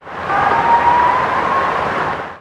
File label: Howl